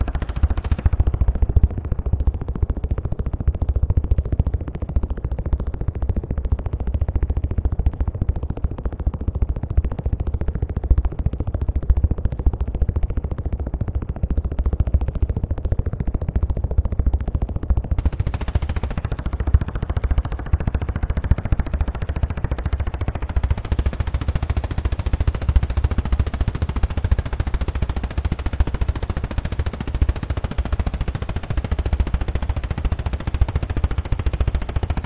Helicopter Without Engine

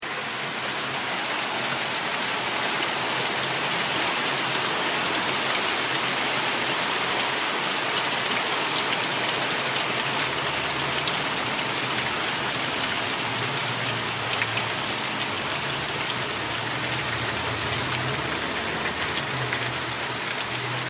heavy rain outside

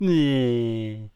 Sticking Tongue Out

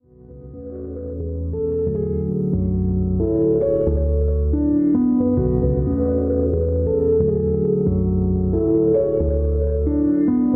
Piano Ambiance 90bpm

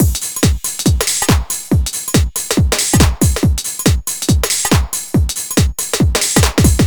house 140bpm; Duppy house

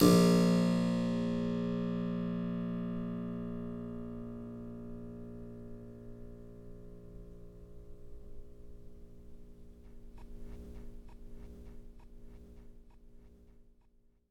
Clav

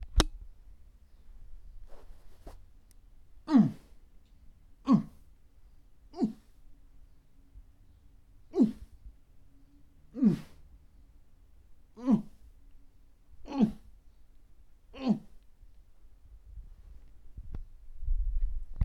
Muffled Scream Agression

